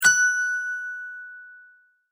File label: multimedia tone correct; Tones; Sound Design; McKinney Sound